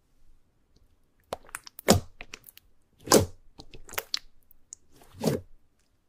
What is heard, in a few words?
Keyboard asmr; اسمر کیبورد با هوش مصنوعی